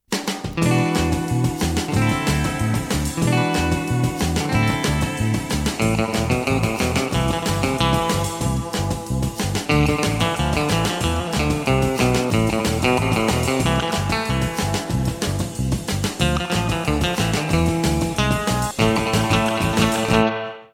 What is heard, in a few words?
Fender